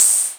Cymbals Open HH; Vengeance Samples

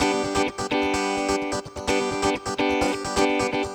Electric Guitar 128BPM G maj; Vengeance Samples; Electric Guitar Kit 1

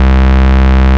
Ultimate Bass Root C; Vengeance Samples

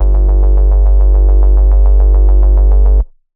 Bassline; Ghosthack

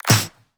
Pre-Shifted Claps; Vengeance Samples

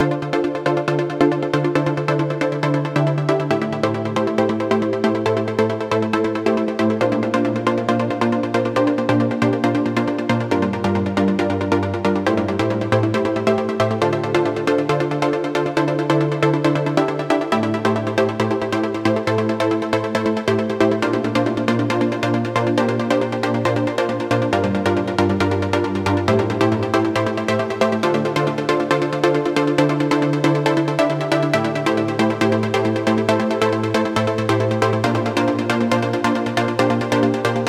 Universe Kit 137BPM Melody Full, D minor; Unlooped; Vengeance Samples

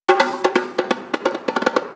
Fill 128 BPM; Loops; Vengeance Samples

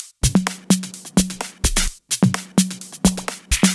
House loops 128BPM; Vengeance Samples

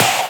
Snare; Vengeance Samples

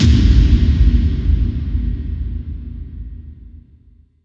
Hits & Explode

